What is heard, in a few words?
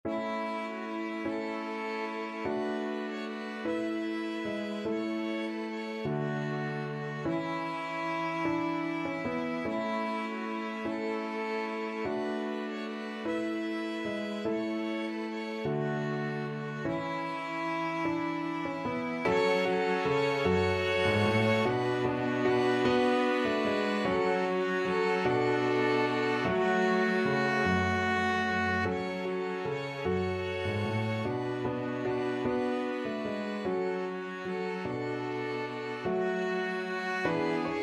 skyeboat, piano quintet